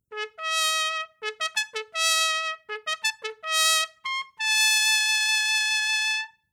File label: Post Horn